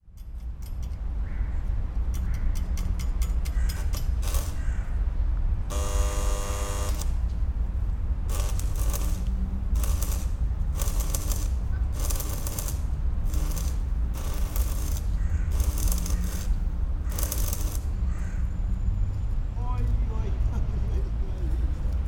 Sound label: welding; sergiyev posad